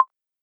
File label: notification; fiverr